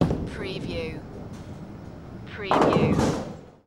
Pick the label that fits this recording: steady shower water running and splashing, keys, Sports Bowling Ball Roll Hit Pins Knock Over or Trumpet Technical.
Sports Bowling Ball Roll Hit Pins Knock Over